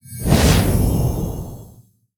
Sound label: Start of Game FX Burst In Sound; Baku the Mooneater